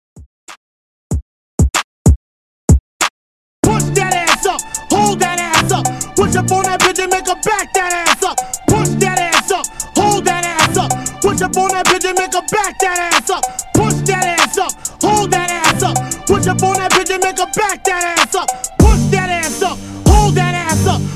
Dj Intro Outro And Breakz 95Bpm

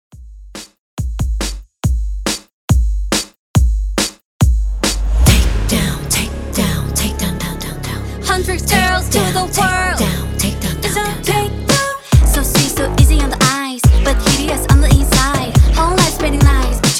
KPop

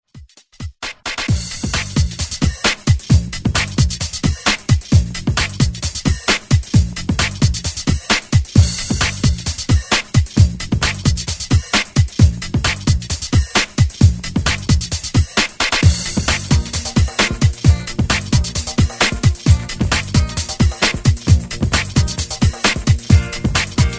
70s

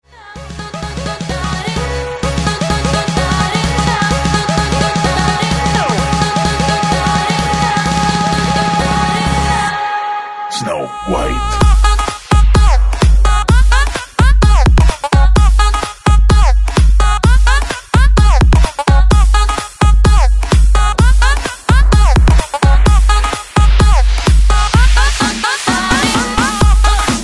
128 BREAKMIX MASHUP

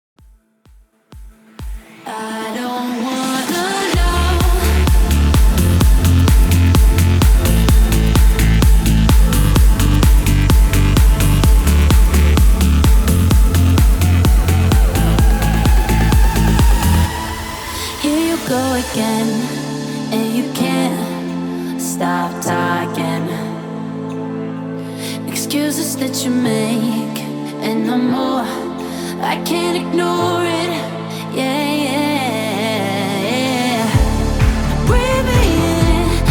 progressive, 128 BPM; PROGRESSIVE CUT EDIT

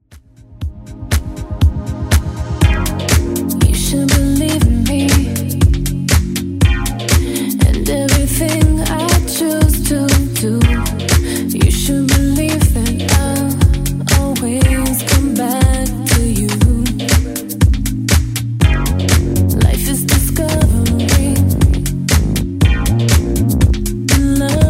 Redrum 120bpm

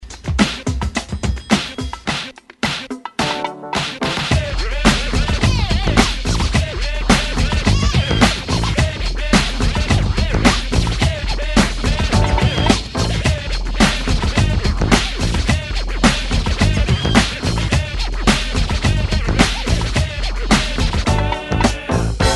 70s ReDrum 109 Bpm

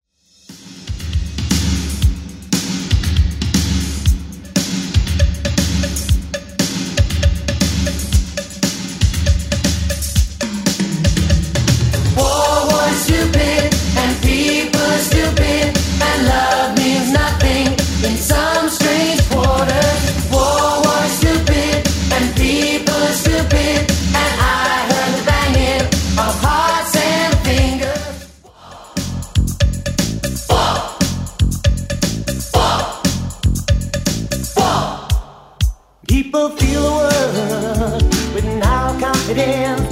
80s Dancemix, 118bpm

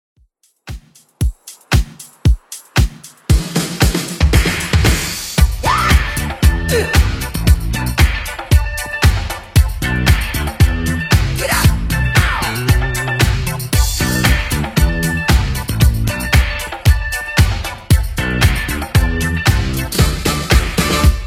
80s Redrum 115 bpm

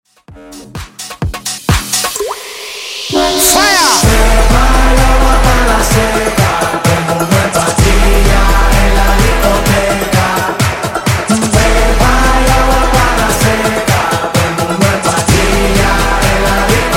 Funk; Straight Fire Edit; 128Bpm

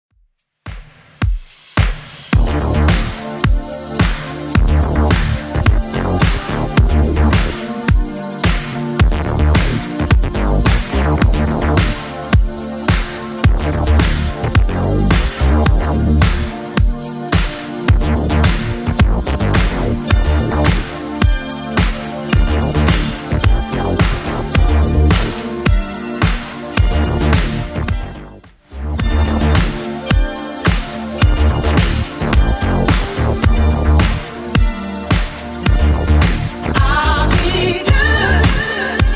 80s Dance Redrum 108bpm

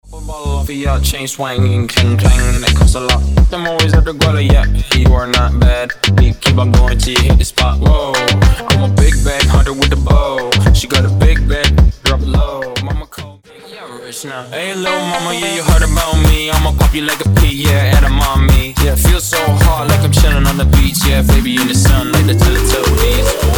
Mash Up Blend 107 bpm

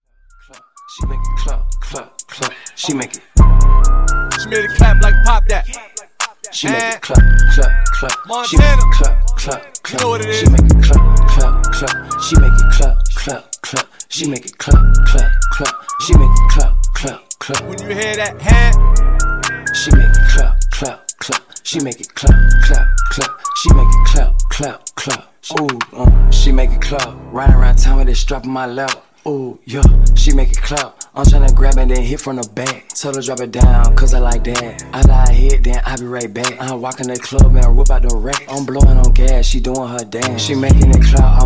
Remix, 127 Bpm

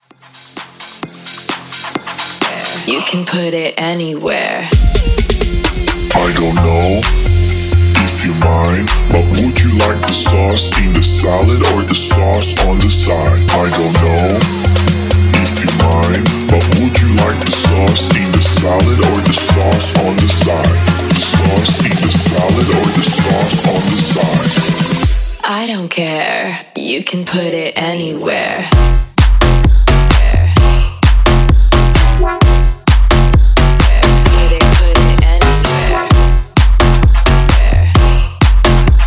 Basshouse 130

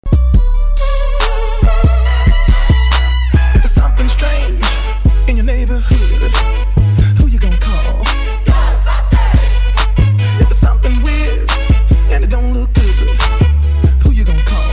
Classic 80s Halloween Club Party Trap Rework

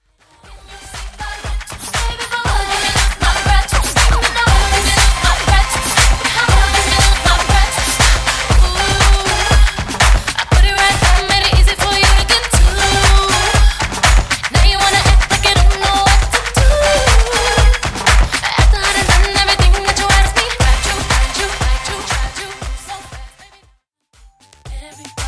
R&B Megamix 2000s 119 95 BPM

